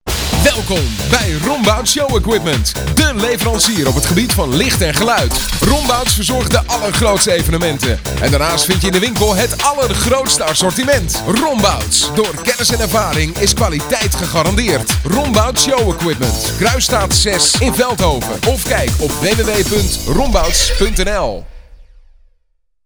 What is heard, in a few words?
jingle; rombouts